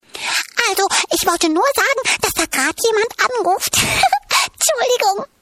Anruf

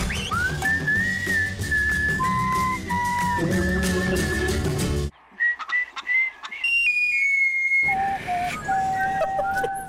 Pfeifen; Hofer KG

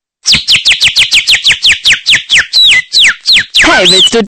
Vogel

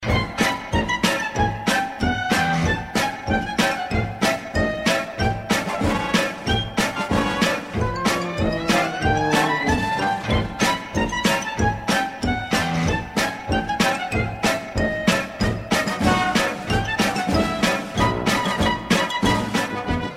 Zirkus Clown

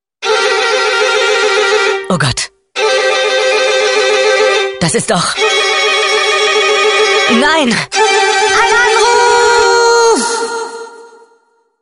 Anruf

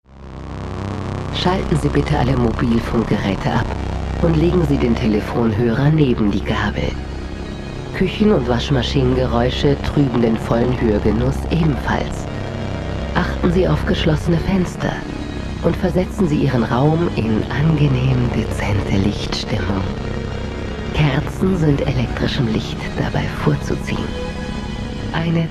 Geilster Klingelton

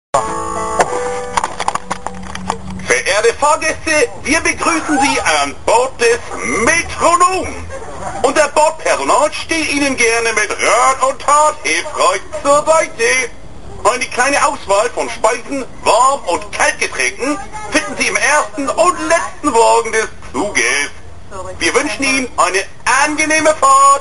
Metronom

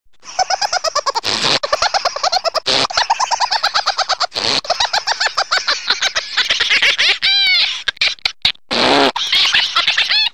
Lachen

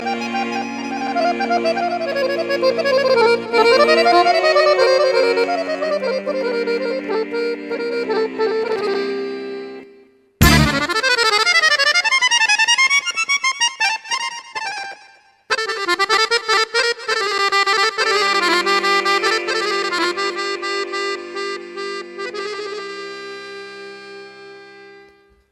Harmonika

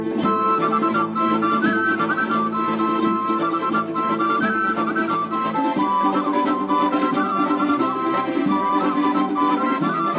Georgian Musik

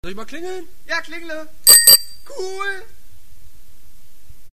Klingeln

